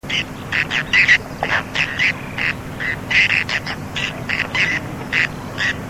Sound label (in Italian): Mignattino piombato